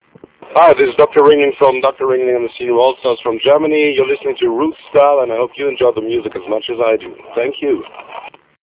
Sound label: jingle ring ding